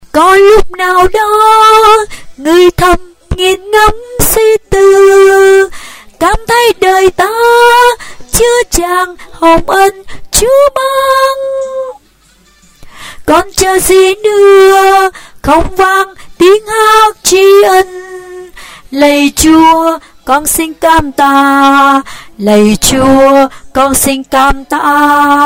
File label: basso tenor